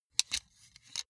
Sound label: sunglasses metal side piece unfold